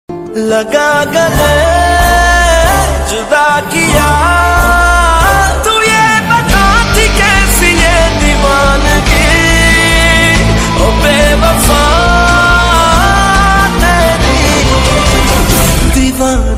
Ost pakistani ringtone

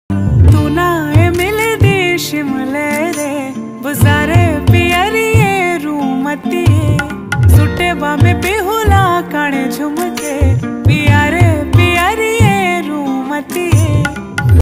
uttrakhandi ringtone

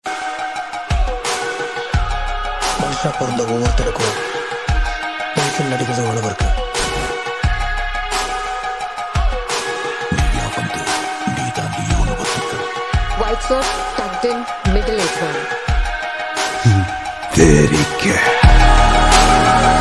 BGM ringtone; Leo

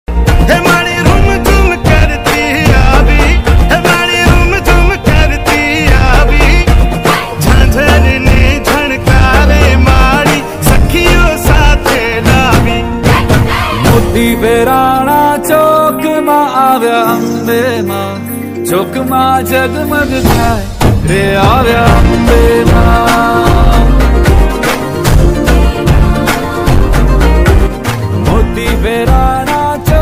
gujarati ringtone

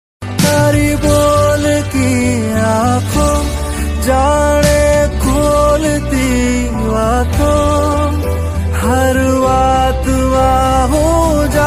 gujarati ringtone

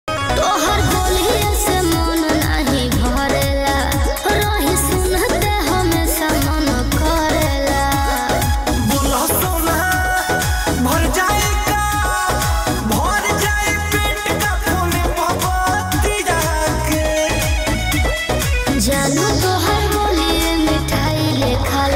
bhojpuri ringtone

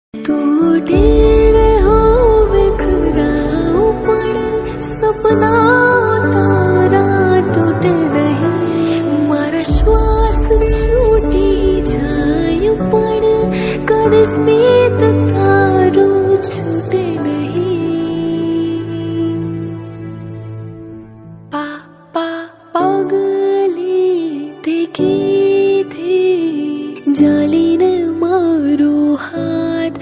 female gujarati ringtone

